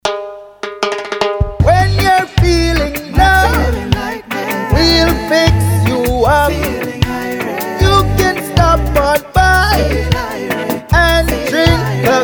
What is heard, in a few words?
Time Jingle; Starbucks